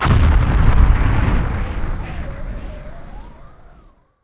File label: heart dead; The Elder Scrolls III Morrowind GOTY; XBOX